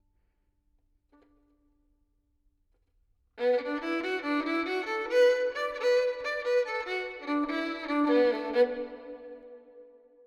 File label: violin verb 4-4 Bm 70; Sample Banks